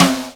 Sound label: SD, Dance Mega Drums; Best Service; SD-HIP